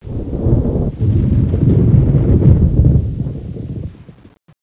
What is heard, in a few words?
thunder far